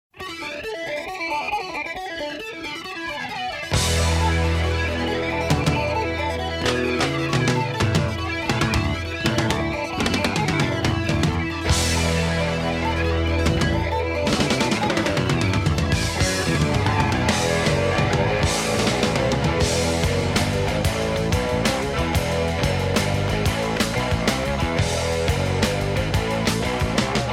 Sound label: les Paul 68